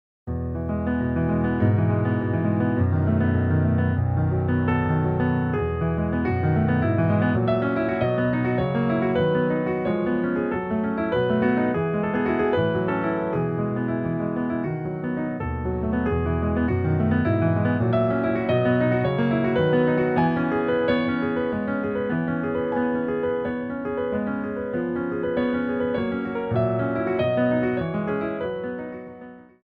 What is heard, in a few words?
Andante con moto; Lieder ohne Worte Op. 19 Nr. 1